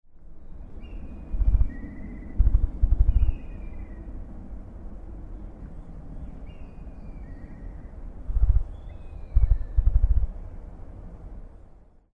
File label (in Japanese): yamadori, takarazuka